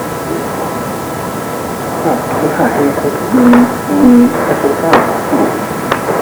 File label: whisper then 2 hums; evp